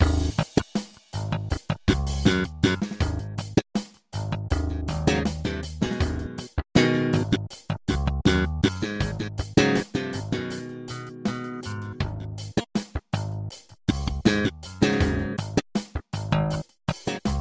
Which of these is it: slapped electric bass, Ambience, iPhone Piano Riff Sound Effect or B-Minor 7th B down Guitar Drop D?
slapped electric bass